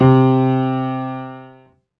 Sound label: Piano, Harmonic